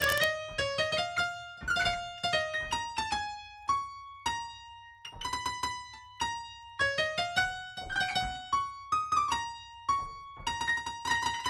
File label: Drop Synth DRY; Drop Synth 6 DRY NO SC